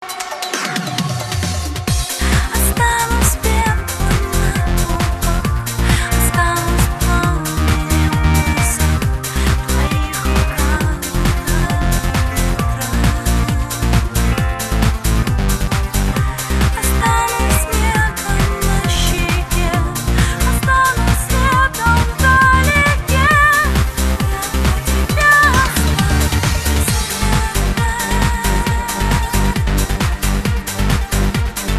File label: electro house remix